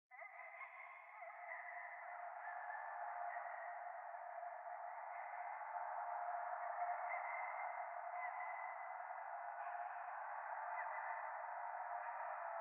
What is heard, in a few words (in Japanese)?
ゴースト 喘ぎ 車の中